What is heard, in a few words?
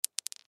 Toy Marble Drop Single; Miscellaneous Cartoon; Foley; Blastwave